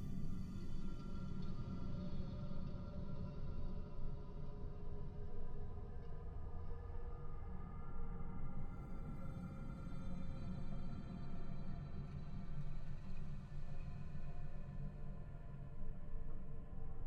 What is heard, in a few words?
amb shurima sentinel base